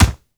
damage; hytopia; payload-game

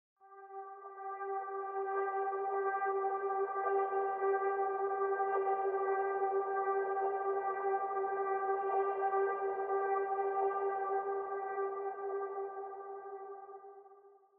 drift bg; thetascape

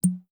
Bottle

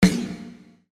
snare

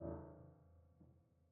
piano harmonics A0, Grand Piano

